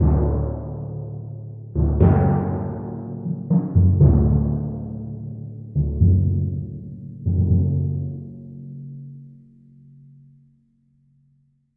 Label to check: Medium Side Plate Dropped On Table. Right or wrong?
Wrong